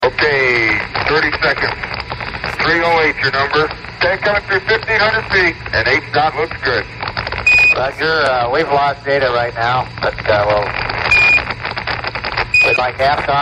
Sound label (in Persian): افکت صدای فضانورد